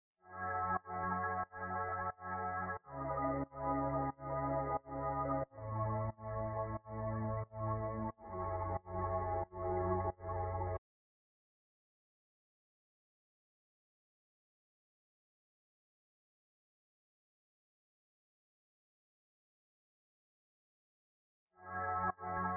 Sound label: 90 BPM C# Min Pad; Loop Stems; Cymatics; Violet